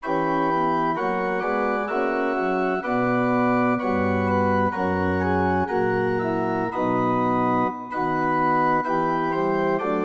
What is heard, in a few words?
Organ